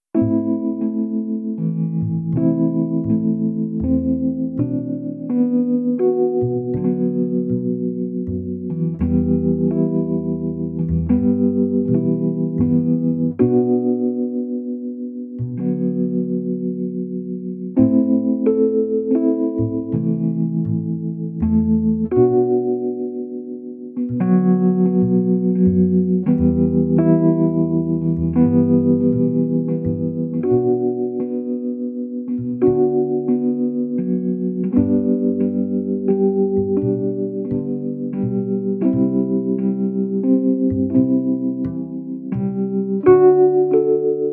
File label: Instrumental; Agnes Creek Woods